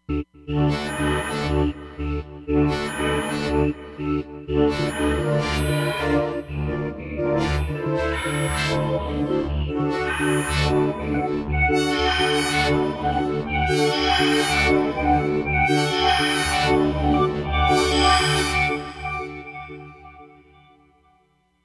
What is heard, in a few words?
Seq Pad, Roland Fantom XR; TMT Seq Pad